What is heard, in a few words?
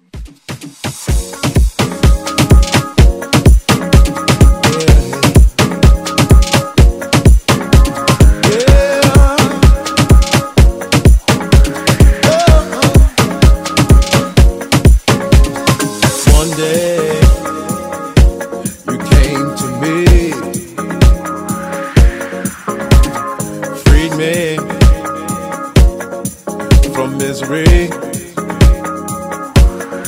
Afrobeat Redrum